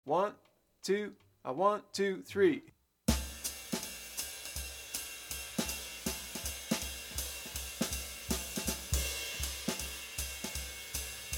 Uptempo Sprint faster, Bop Drumming; The Art Of Bop Drumming; Riley

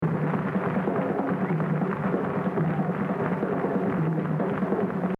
Exercise, jazz drumming; Exercise 19 Gene Krupa - 100; The Evolution of Jazz Drumming (Gottlieb)

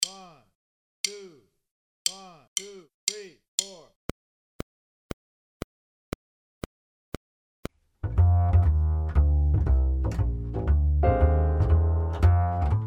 Swing, Drum Fills; Essential Drum Fills; Erskine

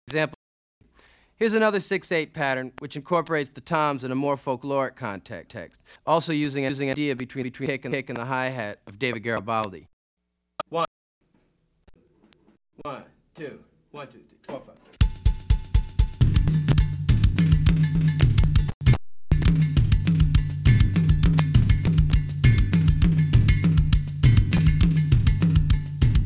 Afro-Cuban Grooves For Bass And Drums

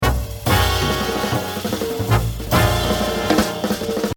Exercise, jazz drumming

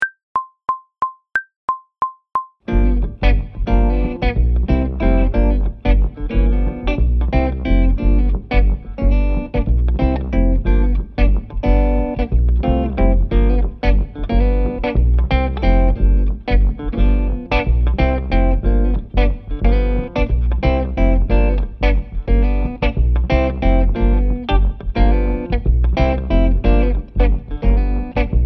Second Line 180bpm, Brushes; Phrasing and Freedom with Brushes

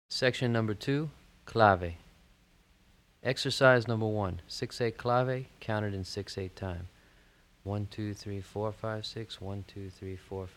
Clave, Drumset

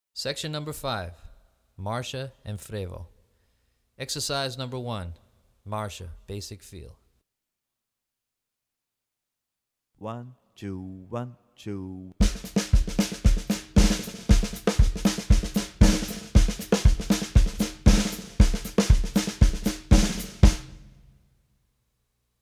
Marcha and Frevo Basic Patterns, Drumset; Brazilian Rhythm For Drumset; DaFonseca, Weiner

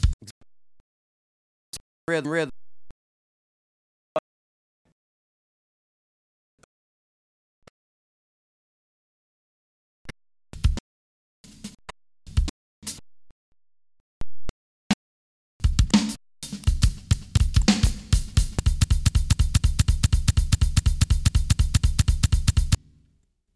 Afro-Cuban Grooves For Bass And Drums

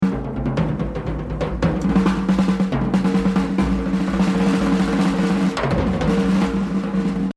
Exercise, jazz drumming; Rufus Speedy Jo; The Evolution of Jazz Drumming (Gottlieb)

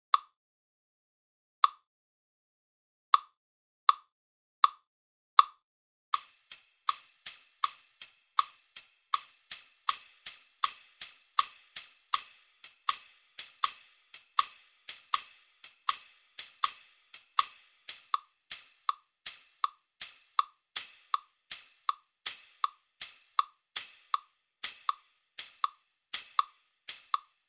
BINAIRE TERNAIRE, Batterie Reggae; Buchmann; Batterie Reggae VIbrations

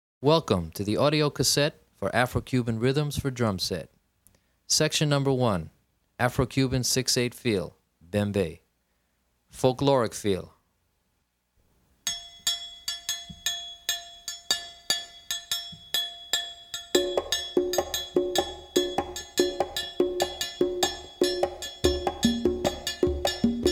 Afro-Cuban 6-8 Feel - Bembe, Drumset

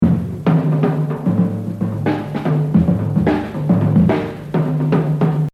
Exercise, jazz drumming; Joe Morello; The Evolution of Jazz Drumming (Gottlieb)

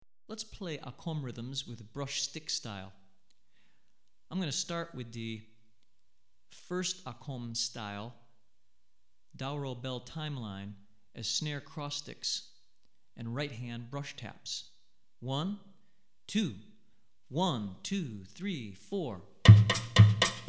Brush, West African Rhythms For Drumset; Akom; Hartigan

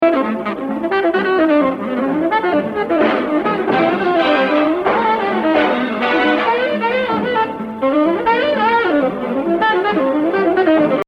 Exercise, jazz drumming; Sonny Payne; The Evolution of Jazz Drumming (Gottlieb)

